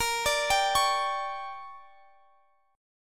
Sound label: D Major 7th Suspended 4th Sharp 5th